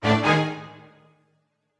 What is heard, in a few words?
Battle Strike